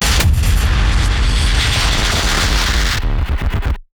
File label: Tension Builder Impact